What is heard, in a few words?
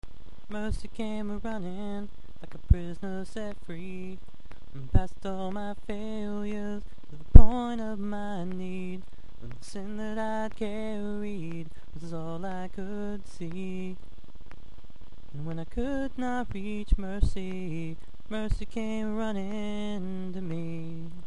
singing